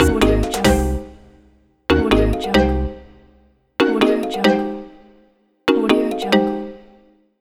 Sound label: bright logo